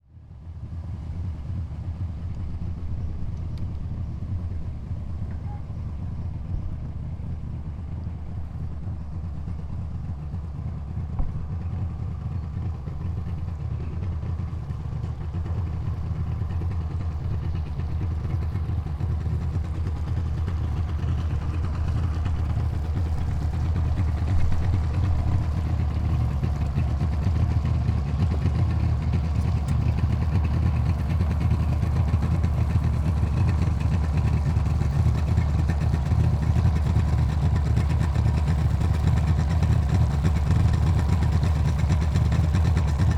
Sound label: Transportation Boat Power Boat Engine Running In Water